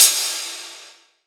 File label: Crash, Cymbals; Vengeance Samples; VEH2